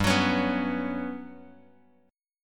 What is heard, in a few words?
G Major Sharp 11th G, Bass Standard; strummed; JGuitar